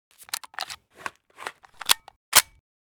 reload, weapons

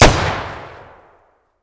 fire distant, weapons; BOITO

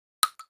12cal shell soil, shotgun; bullets

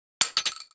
12cal shell metal, shotgun; weapons sound; bullets

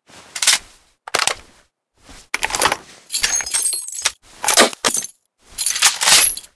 mag reload; Marrua

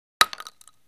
12cal shell wood, shotgun

